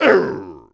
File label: Swing; Moblin; zelda sounds